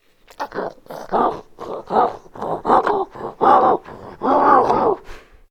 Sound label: idle, zombie; DayZ-Epoch; dayz sfx